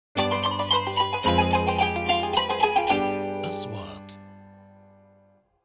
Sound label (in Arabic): نغمة شعار; sound track